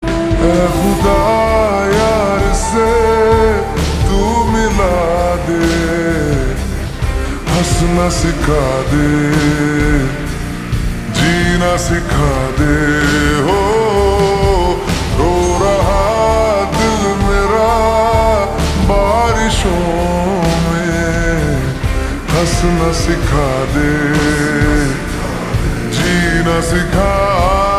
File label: Ringtone slowed a reverb